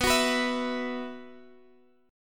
B Suspended 4th, Guitar, Half Step Down

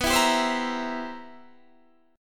C 7th B, C7/B chord; strummed

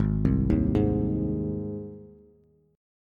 Ab Major, Bass; arpeggiated; 4,3,1,1; JGuitar

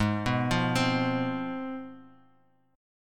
C Major 7th Suspended 4th Sharp 5th, chord

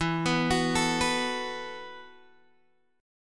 E Suspended 2nd Suspended 4th, Guitar, Open G; x,9,9,11,10,9

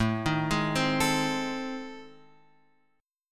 A Minor Major 7th Flat 5th; AmM7b5; {5 6 6 5 X 5} arpeggiated

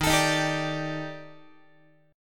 D sharp Major 7th Flat 5th D sharp Guitar, chord; D#M7b5; strummed; x,11,12,13,11,13; 4A,4E,5A,5D,5Gsharp,6Csharp; chord shape

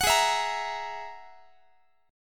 G sharp 7th Suspended 2nd Sharp 5th, Guitar; G#7sus2#5; strummed; x,x,21,18,20,21; JGuitar

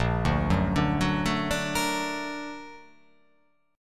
A sharp Augmented Major 7th A sharp, guitar

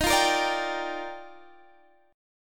G Minor 7th, Eb; Gm7/Eb; strummed

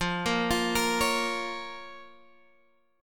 F Suspended 4th Sharp 5th F down Guitar Open D

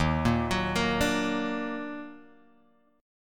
D sharp Minor Major 7th, Guitar, Drop D; 1,1,4,3,3,x; chordshape

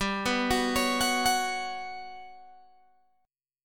G Major 7th Flat 5th G down Guitar Open G

